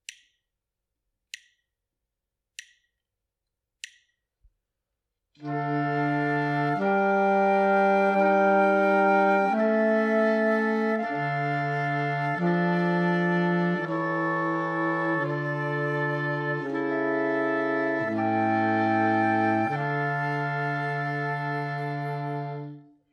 harmonic dictation; ear training

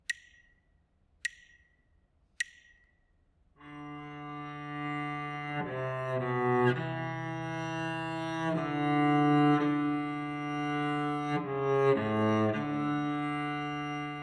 partially completed melodic dictation

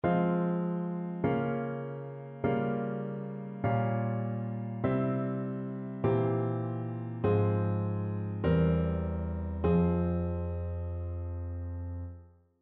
harmonic error detection

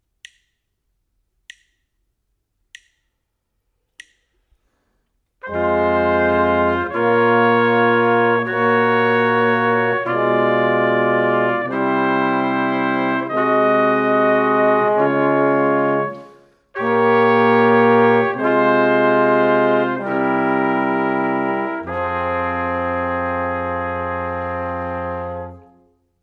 harmonic dictation; ear training

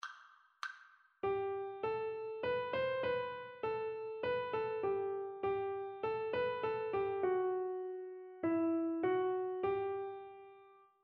rhythmic dictation

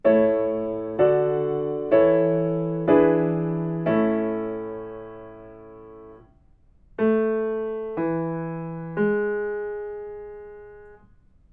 melodic fragments

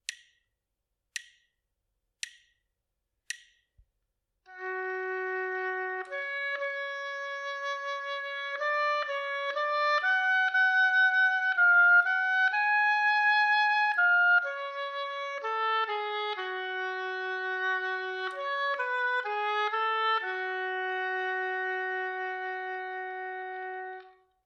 melodic error detection

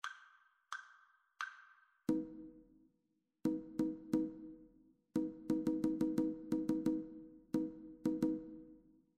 rhythmic dictation

